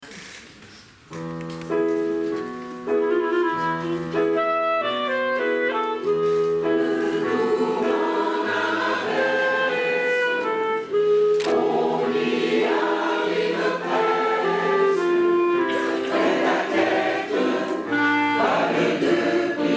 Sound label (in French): chorale; croque notes